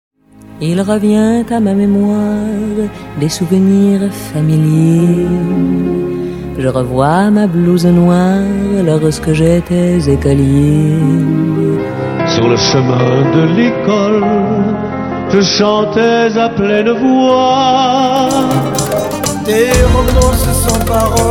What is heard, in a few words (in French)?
Chorale